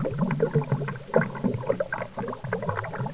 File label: slurpy, ambience